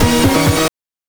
BANK2 SFX, NES DPCM; MIDINES

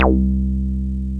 TB303 SQR, ROLAND TB-303 BASS; Rubber Chicken Software Sample Bank

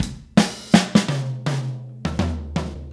Soul Drums Fill, 70s Analog Funk; Sonic Foundry (Sony Creative Software); What it Is!

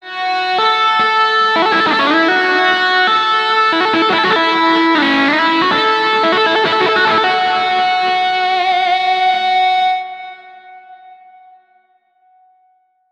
guitar, stringed instruments

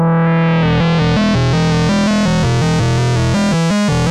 JUPITER 8 E2, Rare Synthesizer; Hollywood Edge - Giorgio Moroder Rare Synthesizer Collection

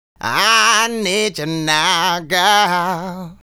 Male Solo, Hip-Hop and RnB Vocals

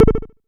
vermona drm1 mk3 fx

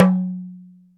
talking drum tone; EMU Planet-Earth

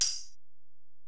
Ac Perc, Drum Machines Alesis DM-PRO

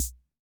Snare, rhythm ace; classic machines

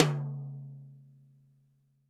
TD-20 Tom A, Roland TD-20 Toms

